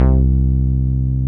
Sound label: Roland PN-D10-02 Wet Synth Bass 1 C2